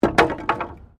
Wood Fall

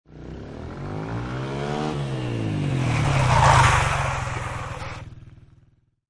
Motorcycle Dirt Bike Skid; Motorcycle motor. Motorbike type offroad Dirt Bike, motocross. Bike approach and skid to a stop.; Lynne Music

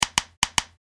A Series Of Clicks

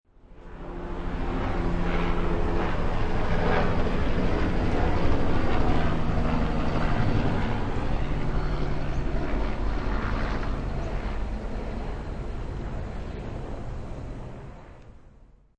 Helicopter, Helicopter flies overhead.; Bombs (Sound Effects:Explosions)

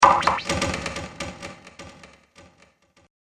Warped Drummer, Science Fiction; Zippy The Warped Drummer

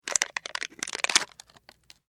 Wrapper Open Plastic; Foley; Kitchen; Household

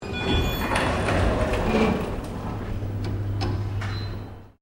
LIFT APARTMENTS NEW DOOR OPENING EXTERIOR; Various lifts operating; Elevators; Sound Effects:Machines; Foundation3

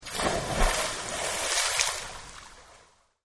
Splash Swimming Pool; Pools; Backgrounds; Partners In Rhyme

